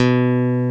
B1, GUITAR; AKAI S-Series CD-ROM Sound Library VOL-8

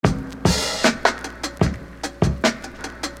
Beat, Hip-Hop Street Beats; Construction Kit; Sonic Foundry (Sony Creative Software)

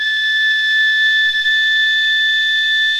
AFRICAN INST; OMI - Universe of Sounds

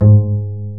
PIZZ BASS, Pizz Double Bass

